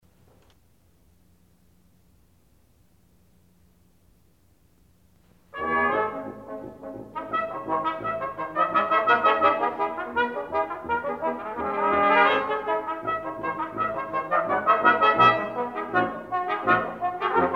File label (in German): Tritsch-Tratsch-Polka